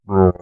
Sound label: meow